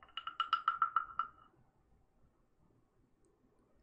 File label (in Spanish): ASMR Sonidos Tibetanos